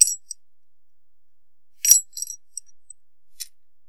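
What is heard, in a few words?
Jewelry Clash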